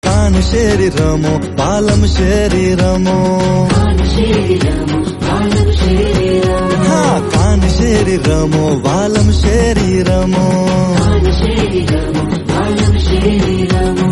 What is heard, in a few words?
Gujarati